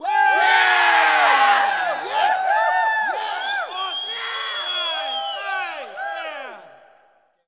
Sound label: Hockey Players Cheer; Hockey Vocal Sounds